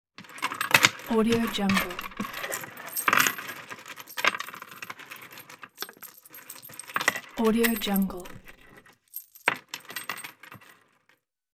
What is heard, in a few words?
coins collect